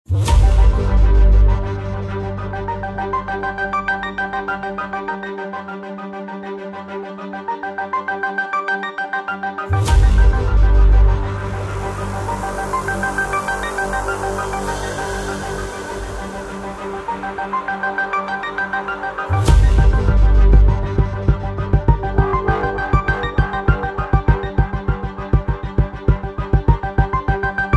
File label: Hybrid Trailer